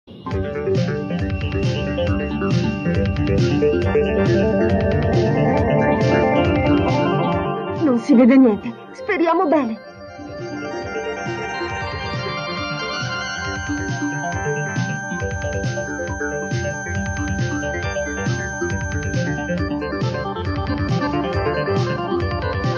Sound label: Beat